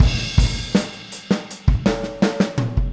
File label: Boogaloo - Fill 07 - Full Mix, 82 BPM, drums; Sonic Foundry (Sony Creative Software) - Drums from the Big Room The Mixes